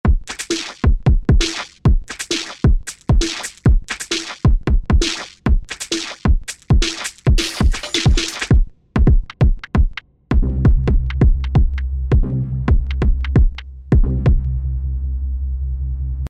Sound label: Beats; Kontakt7